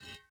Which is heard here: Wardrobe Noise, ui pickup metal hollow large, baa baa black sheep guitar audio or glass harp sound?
ui pickup metal hollow large